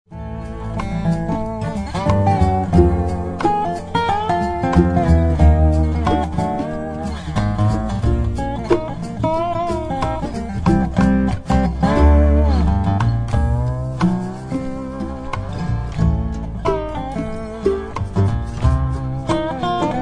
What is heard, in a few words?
spooky pass